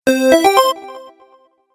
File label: Stage Level Complete, Video Game Sound Effects